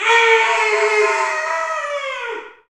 Bullhorn, Afro Horns, Africa

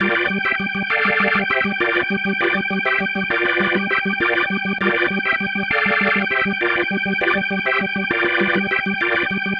Pattern, Organ

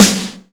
GATE SN, Snare

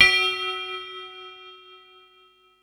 mallets

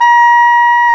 SAX SOP MF A, Soprano Sh; Roland LCDP07 Super Sax

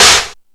Found Sound, E-MU Pro-Cussion

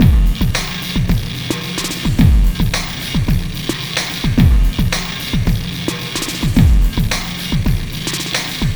Sky Lab Beat, Processed Drumkits

